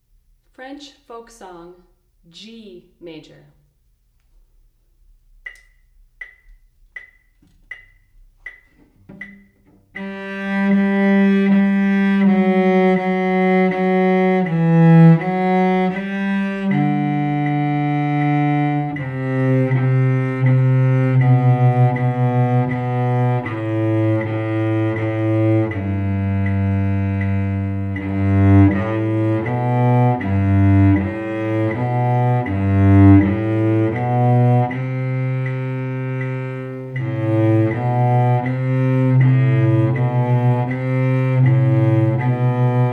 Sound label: French Folk, cello; French Folk Song in G; 1st position